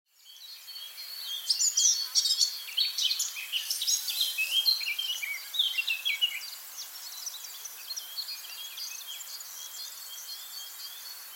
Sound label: Sylvia atricapilla; SETE